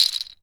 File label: HUSK SHAKE, Cameroon; AKAI S6000 CD-ROM - WorldSounds; CAMEROON PRC